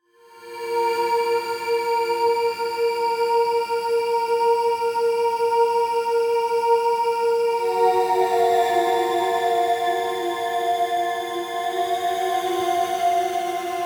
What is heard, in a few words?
sideband